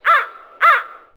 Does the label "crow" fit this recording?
Yes